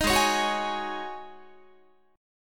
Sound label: Ab 7th Suspended 4th Ab down Guitar; Ab7sus4 strummed